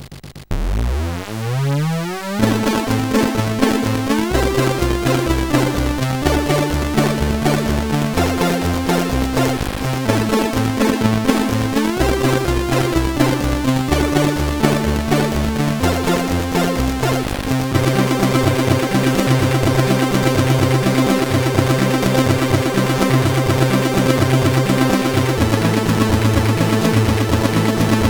Beat-Box, CSG8580R5; sid

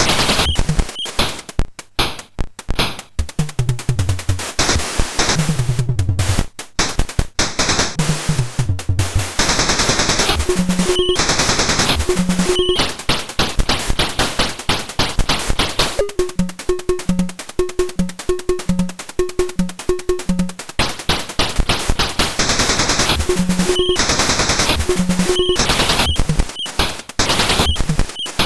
sid MOS6581R4

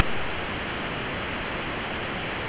Project Firestart, MOS6581R4

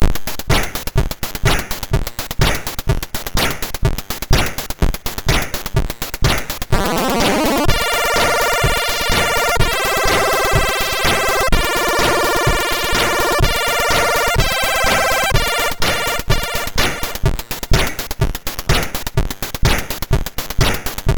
House, sid MOS6581R2; Svetlana Tovarisch

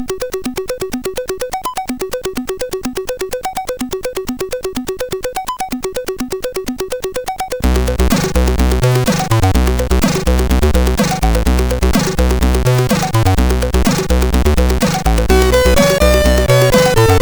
Action March, MOS6581R4

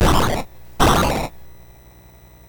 BASIC sid MOS6581R4, GAMES; Sword of Fargoal

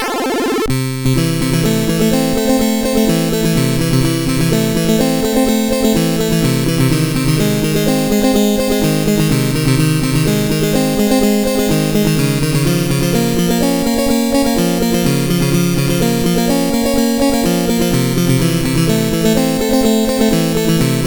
Piano Pling, CSG8580R5; HVSC

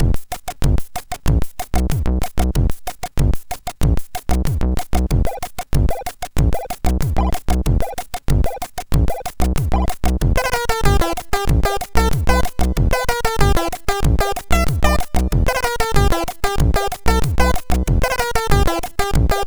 Jazz, MOS6581; MOS6581R2

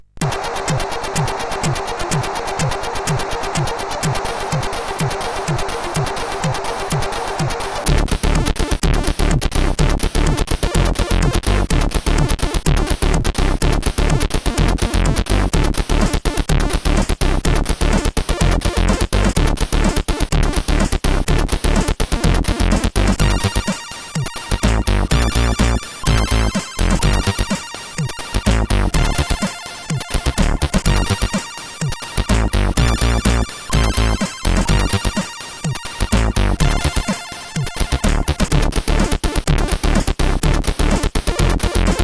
acidstyle, sid CSG8580R5

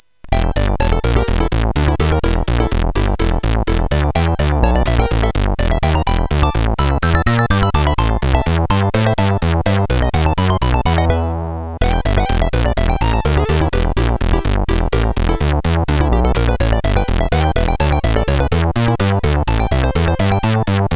Piano Jazz, CSG8580R5

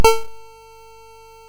Buggy Boy, MOS6581R2

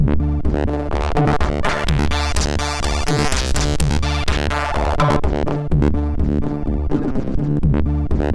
Crowd, MOS6581R2; sid; hvsc

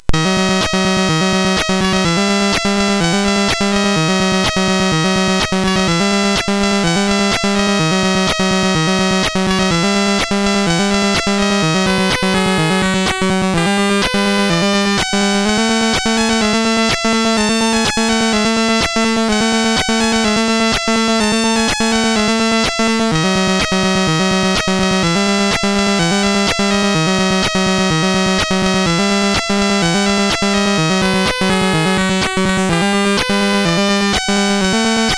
sid MOS6581R4; Sonic Boom

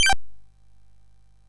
MOS6581R3, GAMES; Spikes Peak

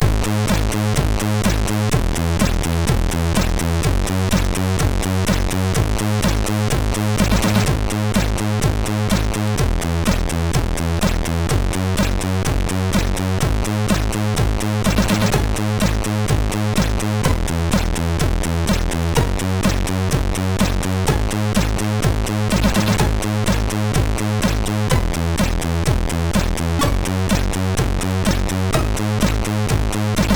Disco Beat, MOS6581R3

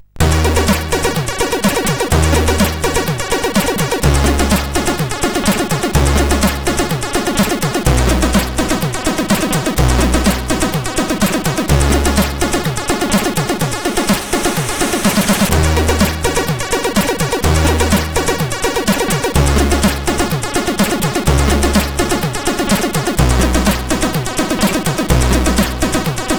Tune 3, sid, CSG8580R5

